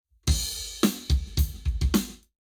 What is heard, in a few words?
Lab Wet, Drums 0deg